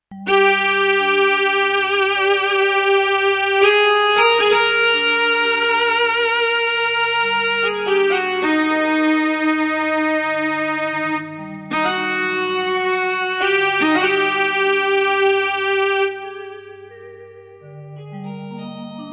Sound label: Karaoke